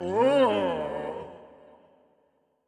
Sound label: Toxic Mask Large Effort